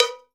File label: cowbell; Univers Sons; Basicussions; COWBELLS